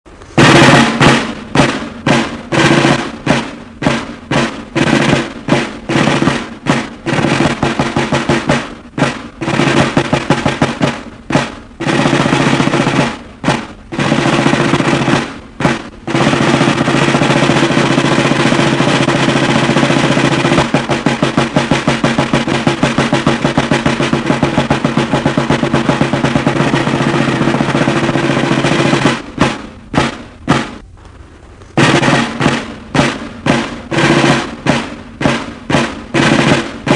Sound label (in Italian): Stamburata